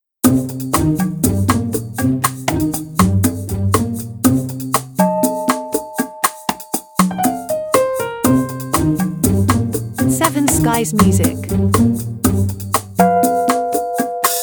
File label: Light Comedy